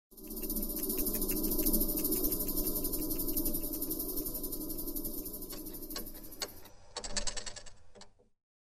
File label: jackpot bounceback; poker game